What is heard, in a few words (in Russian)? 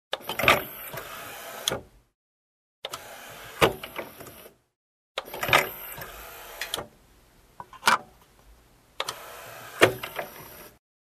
zvuk lotka dlja diska v magnitofone